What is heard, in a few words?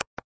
btn press; Ben 10 - Escape Route